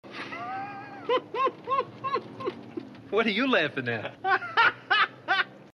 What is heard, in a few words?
laughter